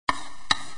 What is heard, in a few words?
Wave